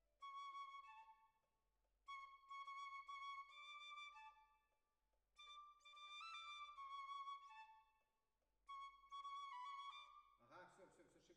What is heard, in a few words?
Oboe